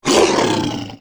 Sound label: monster